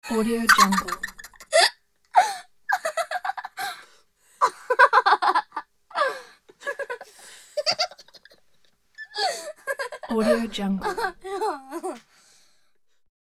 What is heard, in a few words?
8 year old child laughing